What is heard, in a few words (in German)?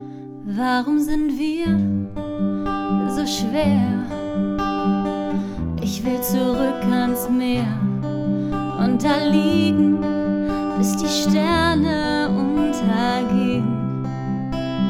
Pop Deutsch, Gesang Pop deutsch; Vocals (Gesang); German